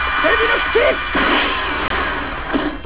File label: Devil Kick; devilman; serie tv